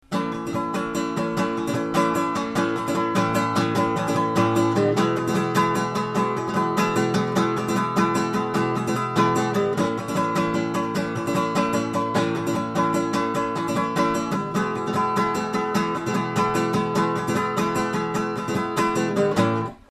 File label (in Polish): bicie 3-4 modyfikacja tempo 100